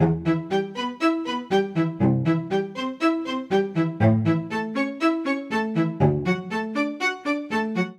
Ostinato Shorts i-VI-IV-VII E Minor 120 bpm, String Quartet; MIDI Clips; Sheppard; Ableton; Spitfire Audio